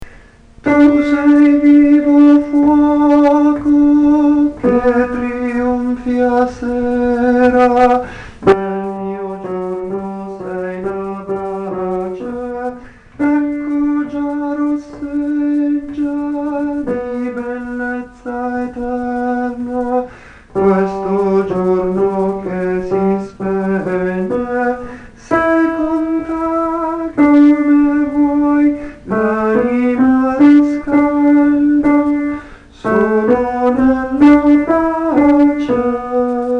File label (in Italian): tenori; Tu sei vivo fuoco